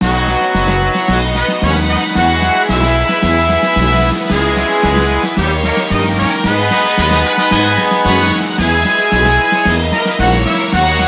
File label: Music event; Power Pro Kun Pocket R